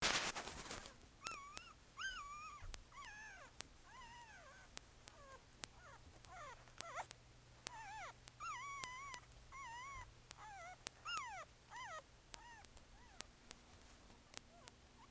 Puppy

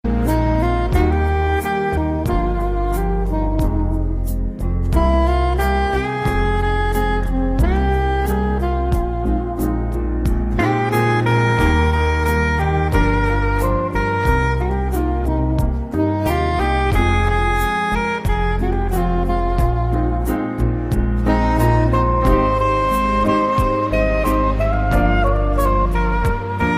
Romantic Sax

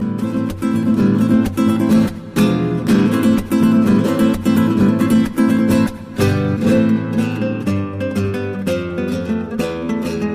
Guitar

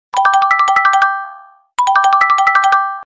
Short I Phone Crack

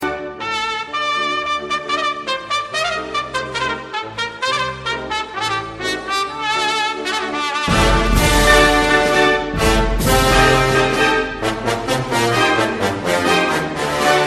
Bullfighters Song

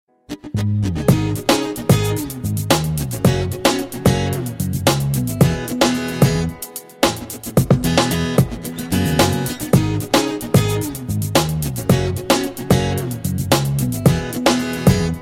Guitar Intro

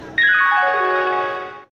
Railway Tune

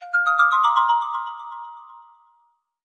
Cool Tone

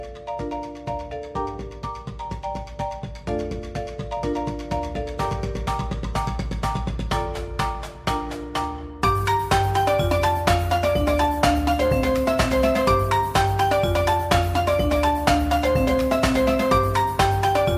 Marimba

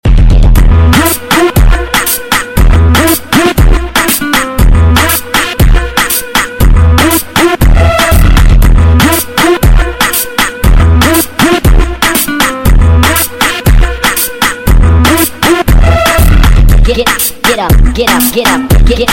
House

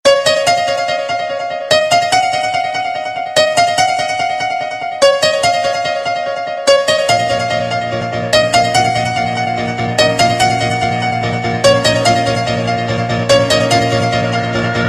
Best Trance

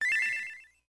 Message; Ffxiv

